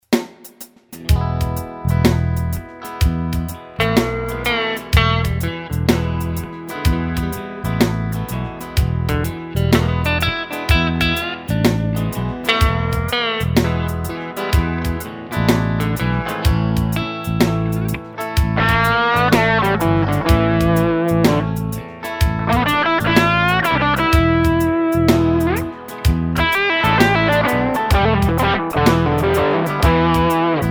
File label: Ethos Overdrive